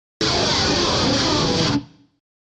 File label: Solo Guitar Riff